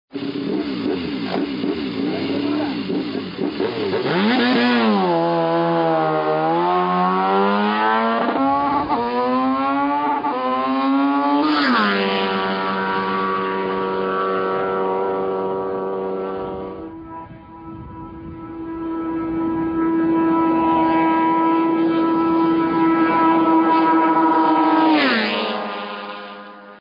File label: Honda NR750